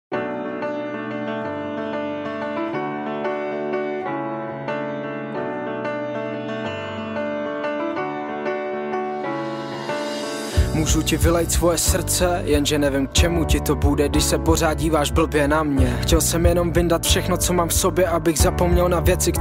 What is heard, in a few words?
atmo music